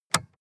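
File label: sports car interior lightswitch hard; verkehr transport; autos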